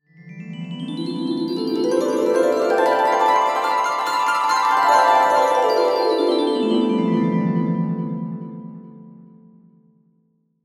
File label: cartoon dreamy glissando harp mallet